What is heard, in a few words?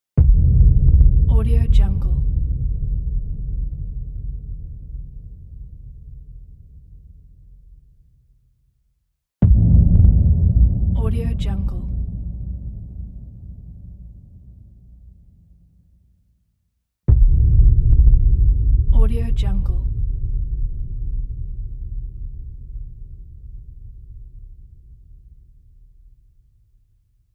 sub bass cinematic hit